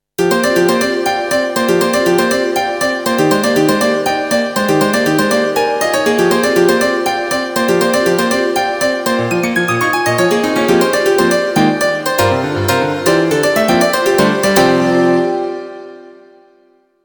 jingle

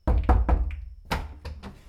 door

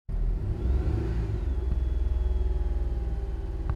engine

